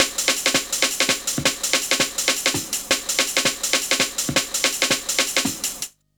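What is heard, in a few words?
jungle loop, 165 bpm; Explosive Jungle; USB Soundscan vol.05; AKAI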